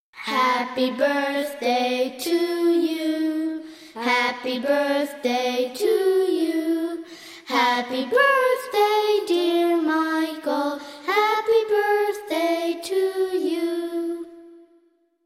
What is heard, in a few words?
happy birthday to you